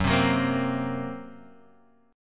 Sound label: F sharp Major Flat 5th, Guitar, Half Step Down